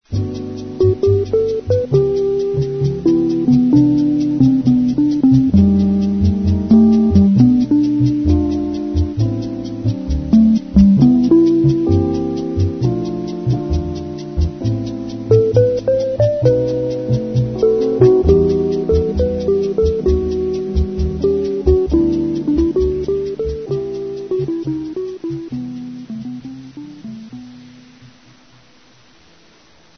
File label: brazilian songs